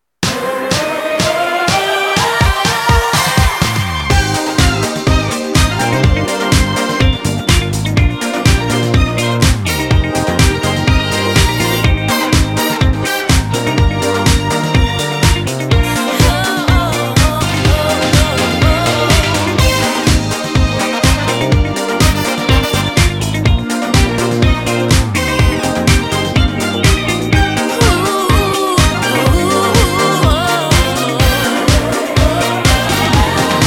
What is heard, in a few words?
Disco Bonus Tune; Flaming Disco; Slot Tunes